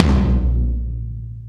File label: Tom, Gated Reverb; musicradar